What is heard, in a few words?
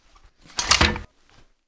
reload, weapons, rpg; Zshiryu777